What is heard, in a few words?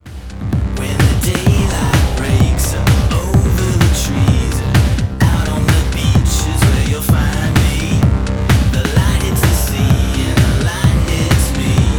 Distant Drum